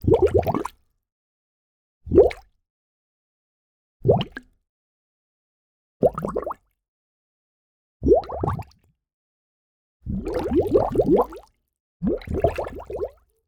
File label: Liquid Bubble Spurts Various Large Bubbles; Aquatic; Articulated Sounds